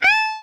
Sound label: jump; cookie run